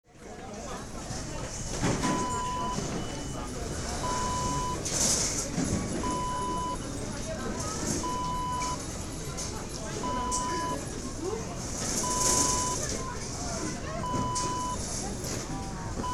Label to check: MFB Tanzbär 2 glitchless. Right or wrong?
Wrong